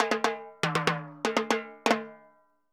Timbaleta Poly, Drums & Percussion; Best Service; Festa Latina VOL-1

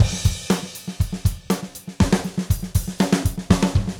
Gretsch groove 120 BPM, 1967 Gretsch Kit drums

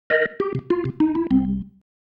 Single Riff, Organ; Loops; Single Riffs; Sonic Foundry (Sony Creative Software); Joe Vitale Organ Donor

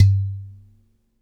Udu Hit Bass; Sonic Foundry (Sony Creative Software); Joe Vitale Latin Percussion

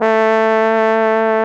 BARITON; Best Service; Alpine Volksmusik

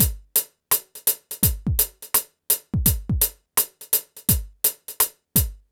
PHATT BEA

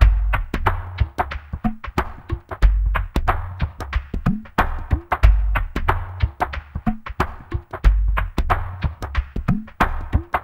CAVERN, Liquid Grooves; Spectrasonics; Liquid Grooves 1 & 2; CAVERN SW

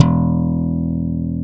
POP E1, BASS; AKAI S-Series CD-ROM Sound Library VOL-2; MX.BASS

